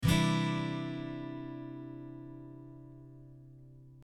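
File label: Cadd9, guitar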